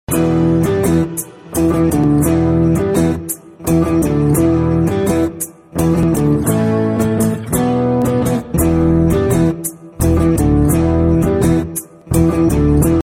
instrumental nice telugu

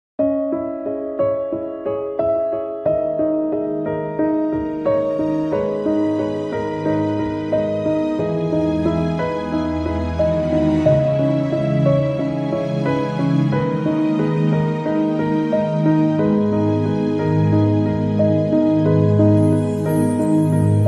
beautiful piano

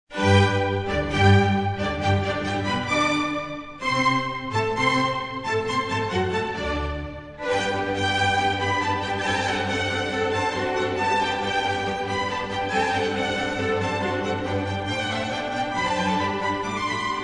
classical mozart

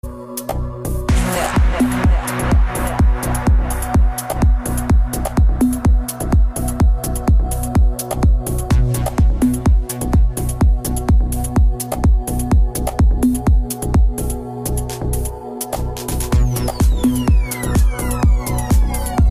dubstep

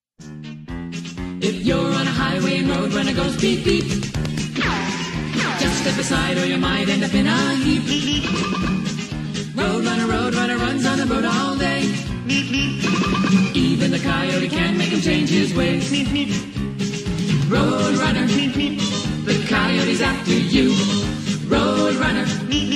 cartoon, ringtone; roadruner

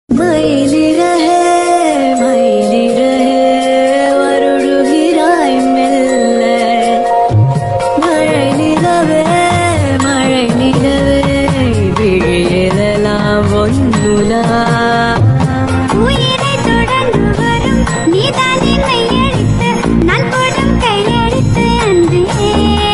tamil romance

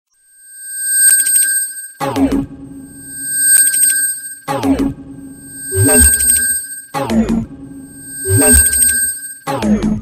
samsung s8, ringtone

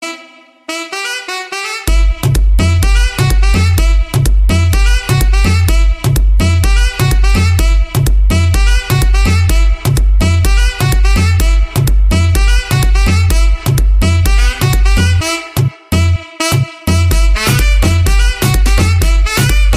swala drum

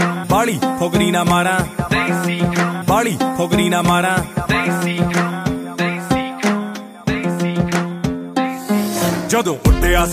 punjabi, ringtone

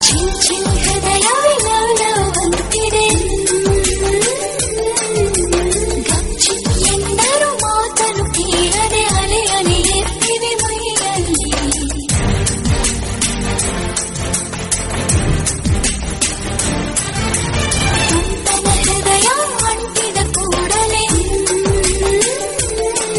kannada music tune; airtel

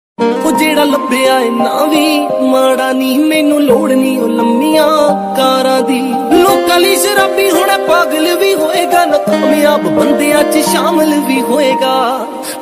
instrumental

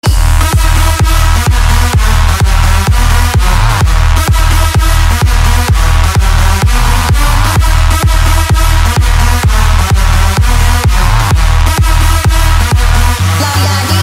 nokia 6 trap mix

